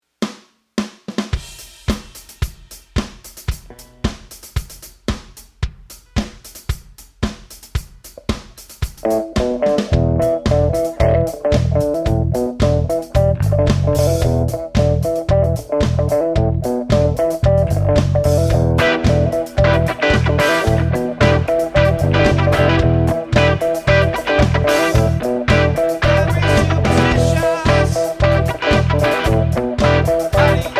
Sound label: Strings